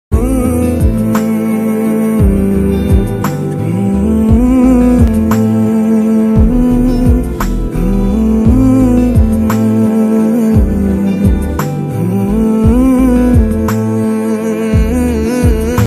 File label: movie ringtone instrumental ringtone hmm; kabir singh